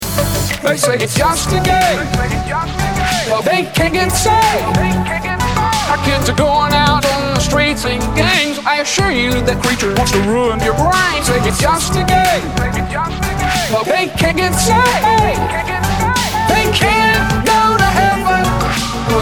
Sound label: ringtone android